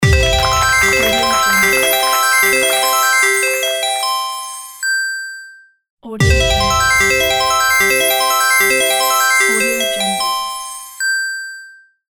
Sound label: game jackpot sound; eskoma